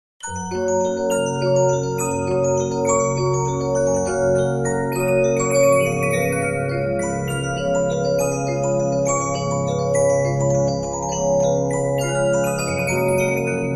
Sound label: Regina, 15inch disc musicbox